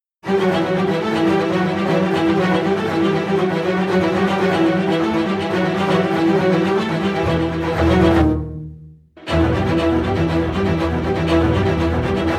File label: strings